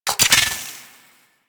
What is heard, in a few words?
Tommy Gun Reload; Weapons; Warframe; Tenno